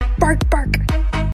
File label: bark